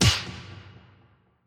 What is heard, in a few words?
Hit